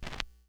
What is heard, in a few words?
Needle Drop, Vinyl Record Samples; Needle Noises